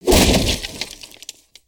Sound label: Crate Smash; destructibles